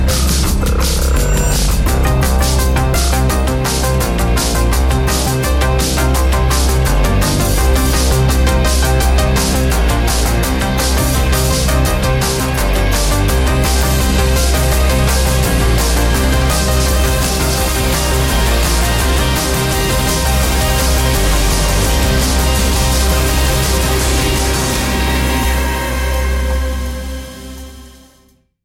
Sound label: Countdown; GTA V